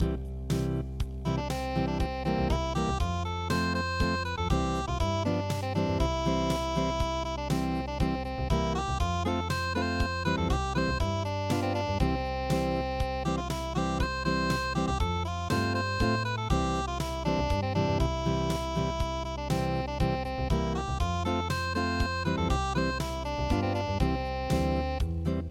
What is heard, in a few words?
unpluged